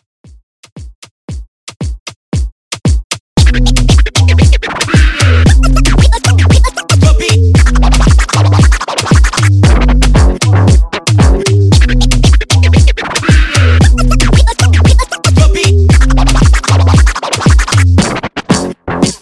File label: Scratch Redrum 115Bpm